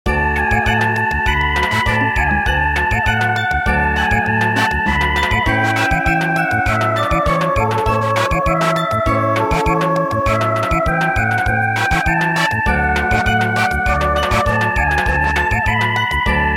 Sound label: Oberek Samba